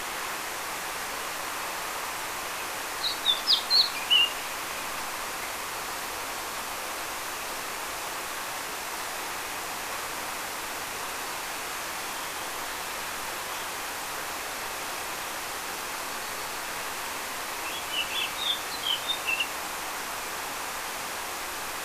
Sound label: Sylvia atricapilla; SETE